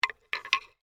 Tonfa Handle Move, Warfare, Tonfa, Handle, Movement; Swords (Sound Effects:Combat); BlastWave